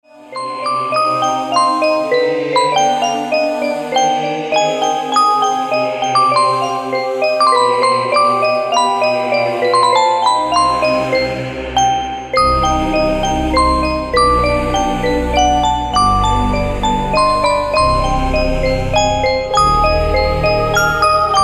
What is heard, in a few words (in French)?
la boîte à musique